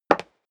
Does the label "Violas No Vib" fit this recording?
No